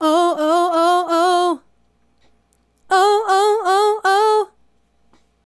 Female Solo, Hip-Hop and RnB Vocals

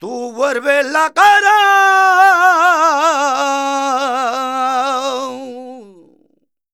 voc c, Flamenco Vocals Male Cantaor; Best Service ProSamples vol.46

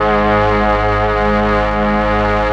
STRING; ILIO; Propeller Island Legendary M400

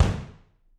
BD, Drums; Bob Clearmountain Drums I

